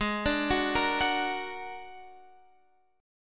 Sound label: A sharp Augmented 7th G sharp